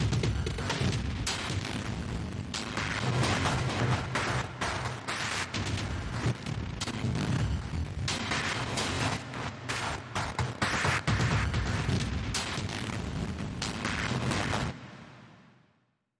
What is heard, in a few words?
Loop Layer Glitch